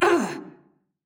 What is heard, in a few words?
Mage Grunt Slow; Realm Royale; Seraphim